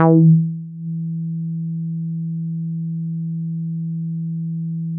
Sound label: bass, synths